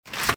slide open, slide door; movers; doors; Soldier Of Fortune II Double Helix; XBOX

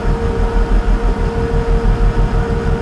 roof; ambience; narshaddaa

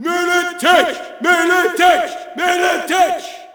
Shout, audience; UFC Tapout 2

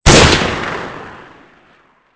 rifle shot; Soldier Of Fortune II Double Helix; XBOX

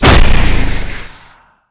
launch; Quantum Redshift

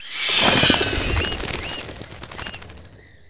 cockatoo; animal; Tony Hawk's Project 8; XBOX

